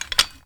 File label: draw lightning gun grip, weapons; tf2